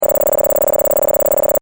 Science Fiction Computer Readout Digital Beeps Short; Computers; SFX Bible